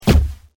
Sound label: bowl